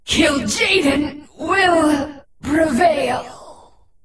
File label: FELBLAZE DEATH